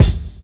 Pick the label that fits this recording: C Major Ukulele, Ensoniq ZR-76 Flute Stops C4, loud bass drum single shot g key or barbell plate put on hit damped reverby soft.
loud bass drum single shot g key